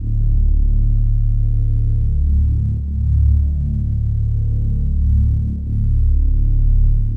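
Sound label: crate Loader hum loop; machines mechanical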